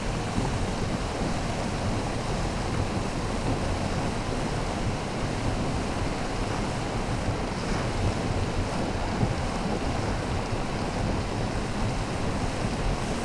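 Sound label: Water Bubble Water Boil; water sounds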